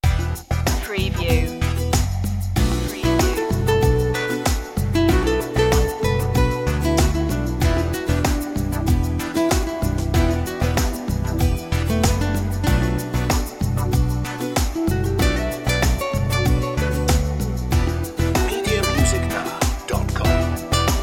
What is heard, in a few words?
love rhythm